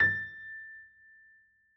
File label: piano a; Golden Crayon Sounds